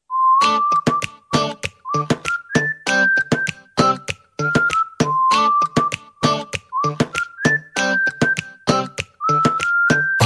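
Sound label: prize ring whistle, ringtones; BLU; G9; koushao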